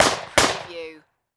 Submachine Gun FN P9; Sound Effects Bible

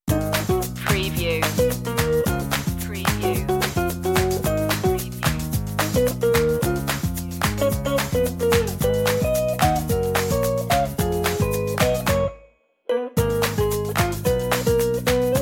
island loop

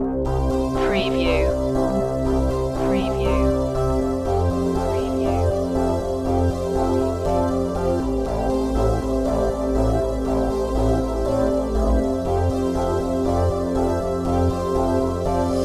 ride the synth wave

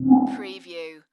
Multimedia Alert

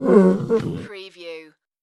creature vocal

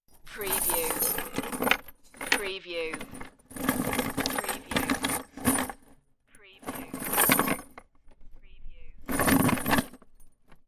Rattle Chain In Wooden Box Long; Industrial / Machinery

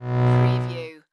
Energy transfer short

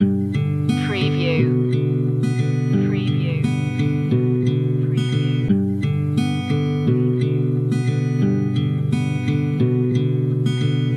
tap your knees loop

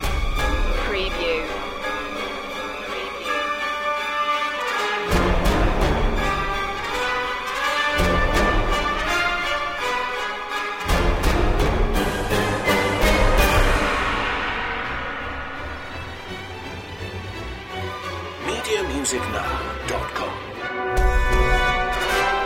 no choir

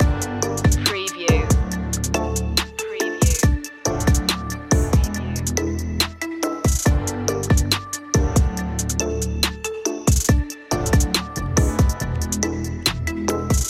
dark shadows loop

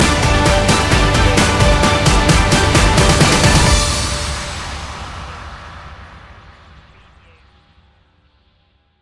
sports hero stinger

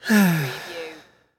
Sigh Male; sound effects bible

